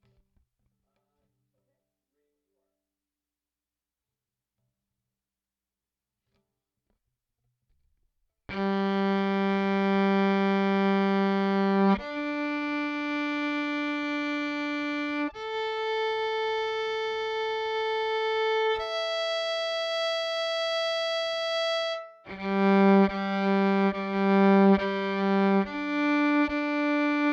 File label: pickup direct